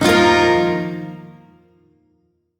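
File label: Shawzin Pentatonic Minor Chord; Warframe; Duviri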